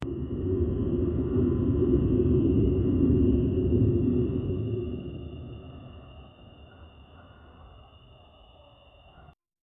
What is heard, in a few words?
void sound effect, cosmos